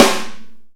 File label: normal hitclap